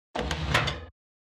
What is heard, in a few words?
metal texture